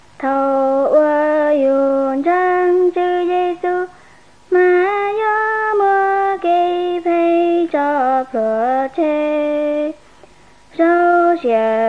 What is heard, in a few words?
songs, hmong white; bible